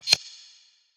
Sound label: Hihat; Ghosthack Advent Calendar Day 4